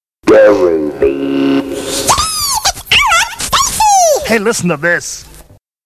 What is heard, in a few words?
Jingles